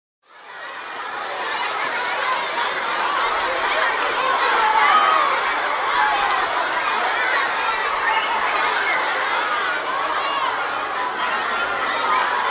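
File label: sound of crowd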